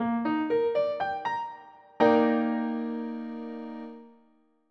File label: EB MAJ7